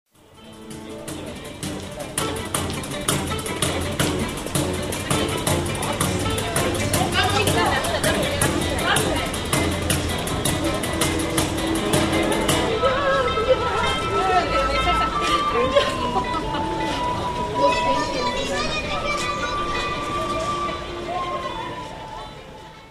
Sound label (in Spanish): calles musicos en la peatonal; cordoba 1300; Rosario